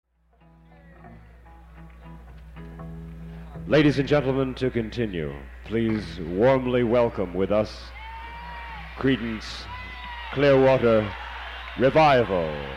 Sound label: wah wah pedals